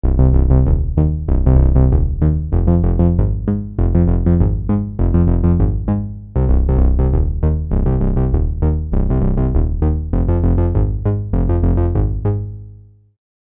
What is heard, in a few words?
Roland JP08 Resobass